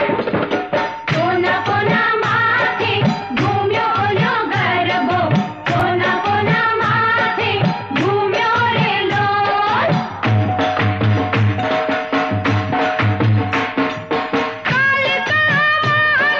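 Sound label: Gujarati Ringtone; Damyanti Bardai; Gujarathi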